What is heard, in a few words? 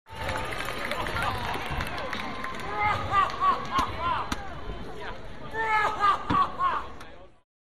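Man Crowd Laughs Exaggerated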